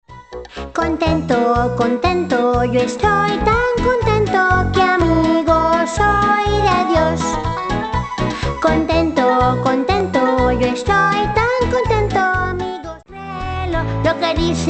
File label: Spanish Sing Alongs